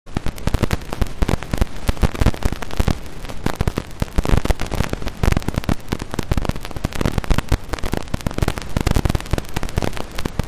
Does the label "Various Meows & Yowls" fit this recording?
No